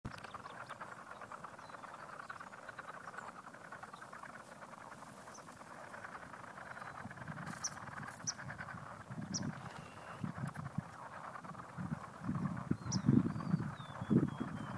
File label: southern leopard frogs mating calls